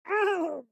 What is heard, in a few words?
town human road hit; Blind Drive